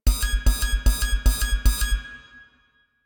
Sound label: Mech Stamina Empty Loop; Nechro Mech; Vehicles